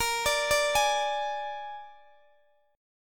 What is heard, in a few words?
A sharp 7th, Guitar, Open D